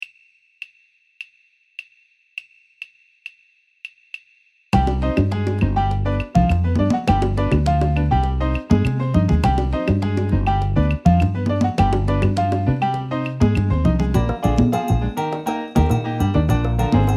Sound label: Salsa Alto accpt